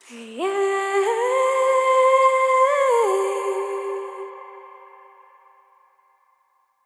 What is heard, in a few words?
Vocal Swell